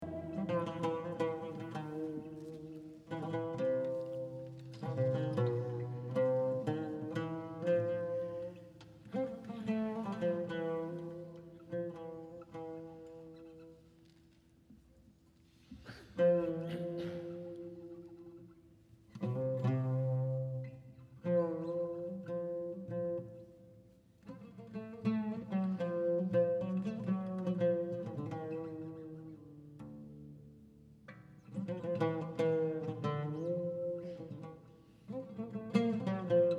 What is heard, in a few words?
Oud Taksim